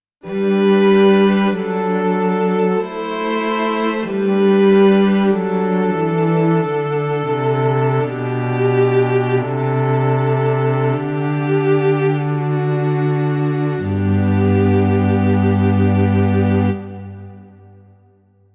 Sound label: Yamaha chamber